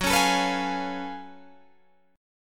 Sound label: Gb Minor Major 11th Gb down Guitar Drop D